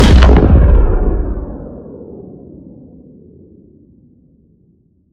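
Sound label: Sci weapon Shot Large Gun Titan Cannon; Robobeat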